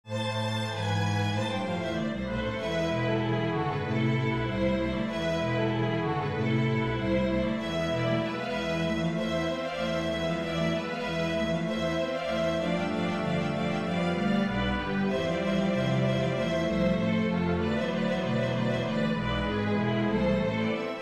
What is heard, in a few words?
concerto aminor, string bass